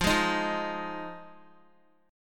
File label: F Minor 7th, down Guitar; strummed; tuning 4F,4A,5C,5G,5C,6E